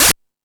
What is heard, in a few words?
House Machine, Drum Machines